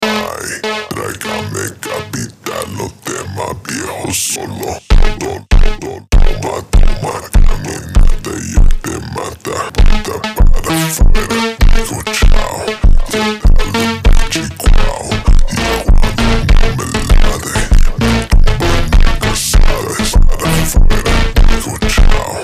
Ultra Slowed

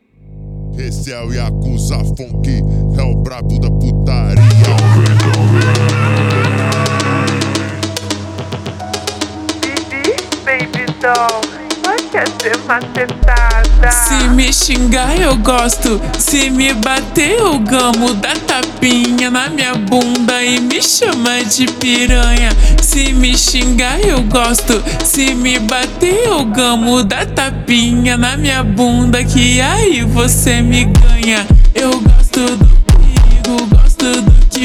phonk step up slowed

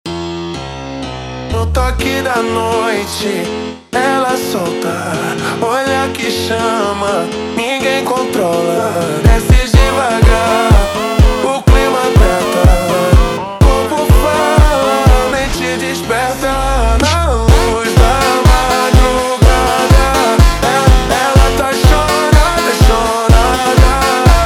(Slowed and Reverbed), Phonk

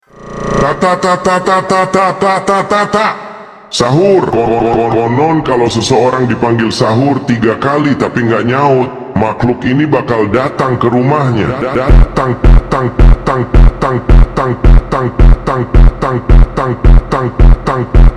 funk super slowed

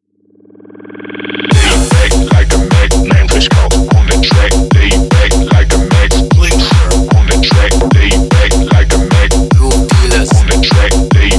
Hardbass

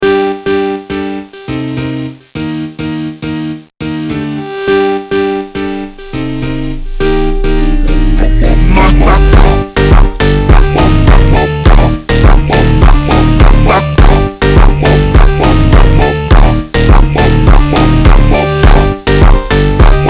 Super Slowed

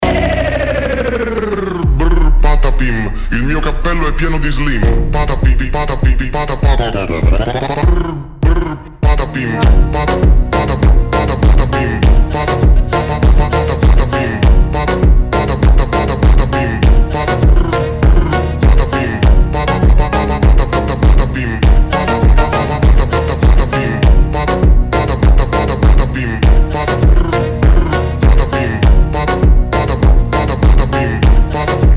funk slowed

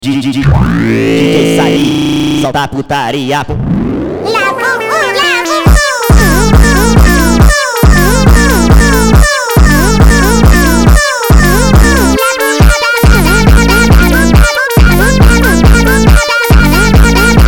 FUNK Sped Up

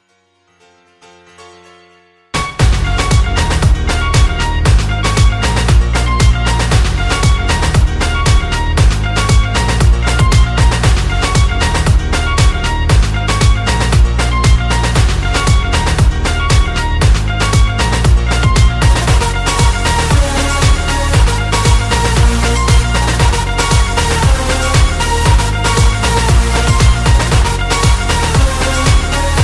Instrumental

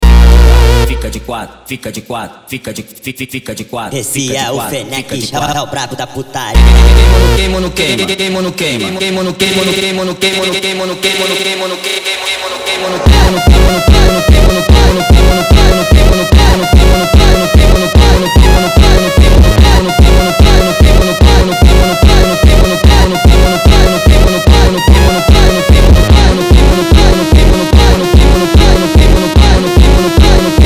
Sped Up

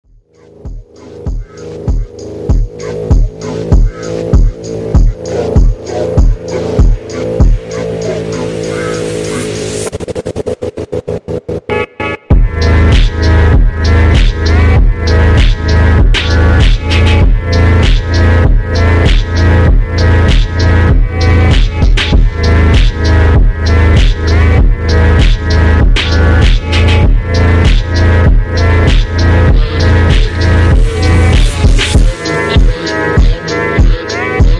Slowed Reverb

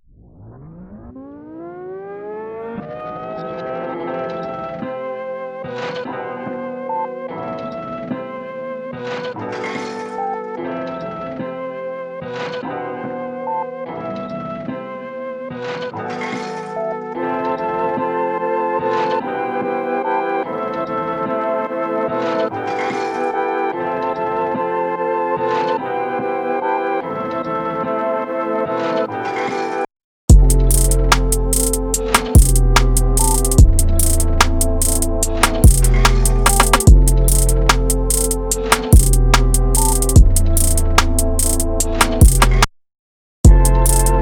Instrumental

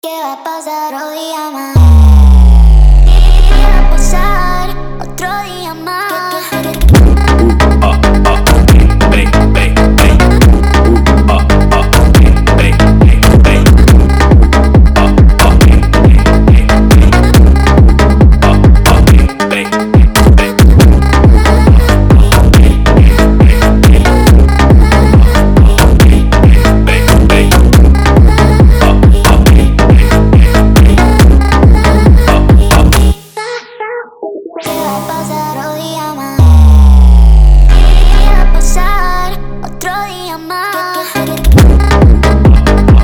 Sped Up

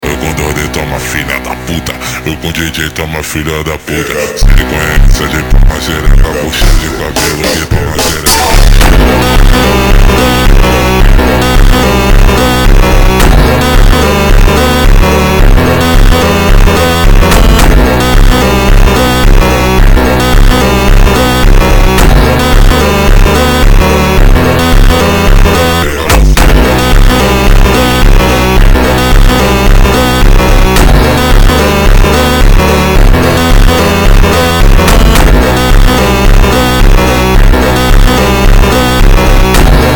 funk slowed

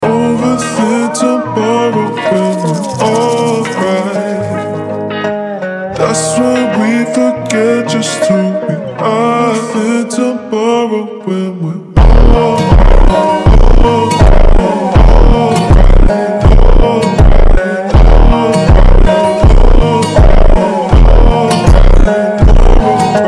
WESTERN FUNK Ultra Slowed

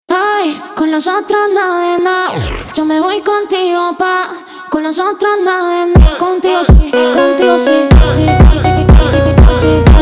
funk slowed

